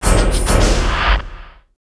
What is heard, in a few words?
PLAS LP, Industrial Loops; USB Soundscan vol.24; AKAI